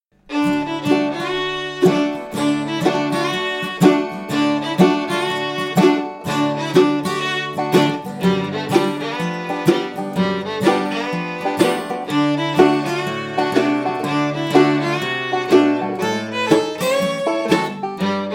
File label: Blues fast, fiddler